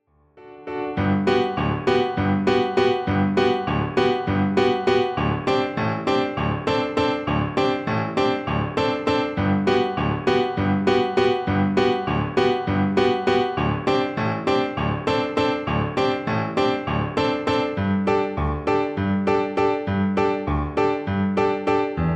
toccatina

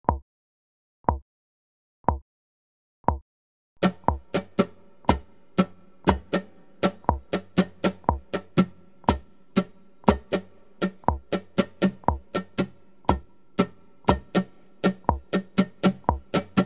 ghost strumming

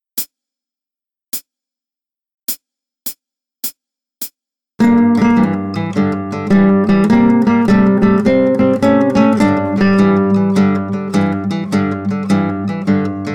blues riff

